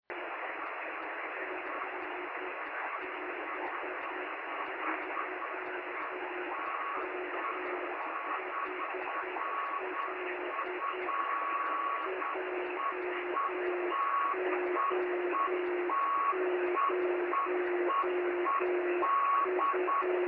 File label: 23cm beacon; gb3mhl